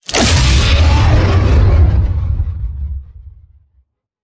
Banshee Missile Launch; Evolve; Elder Kraken

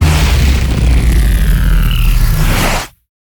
Vortex Blast Activate; Evolve; Kraken

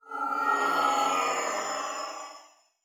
Teleporter Abduction Fail; Evolve; Wraith

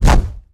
Whoosh Arm; Evolve; Meteor Goliath

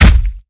Melee Impact Base; Evolve; Behemoth

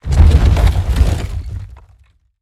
Rock Prison Collapse; Evolve; Behemoth

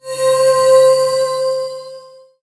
cast

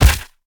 Collisions FS Slow; Lurker; Evolve; Gorgon

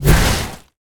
Death Wall Interior Start; Evolve; Elder Kraken

